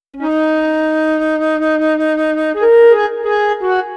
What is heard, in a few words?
Flute, Cinematic Funk